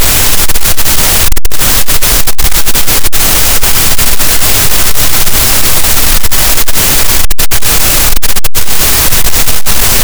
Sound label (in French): cachalot negatif, gaussian noise